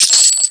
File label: cash register